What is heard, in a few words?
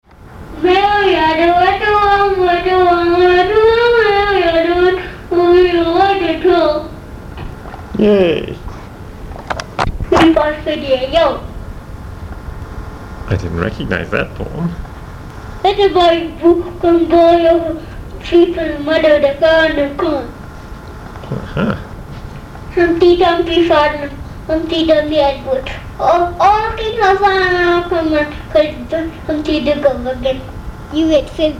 Nursery Rhymes